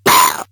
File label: pain; awesomenauts